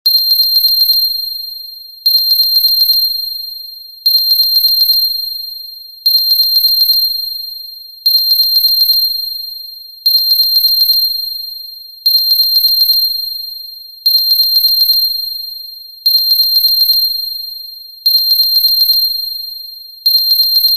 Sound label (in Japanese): 可愛いアラーム音